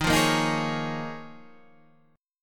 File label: D sharp 7th Suspended 2nd Suspended 4th D sharp, Guitar, Standard; strummed; 11,8,8,8,9,9; D#7sus2sus4; JGuitar